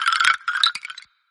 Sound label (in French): squelette spawn